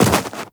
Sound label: Run Snow, Footsteps; Warframe; Tenno